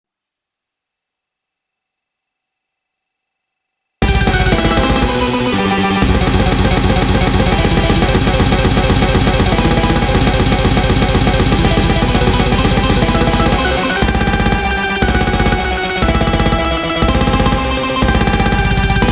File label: instrumental